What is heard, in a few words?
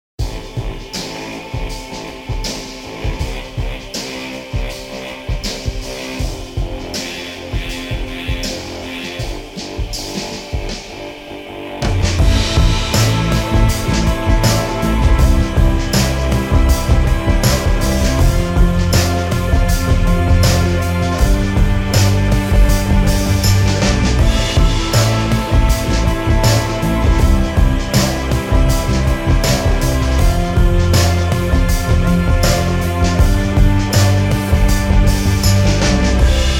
Mellotron Meltdown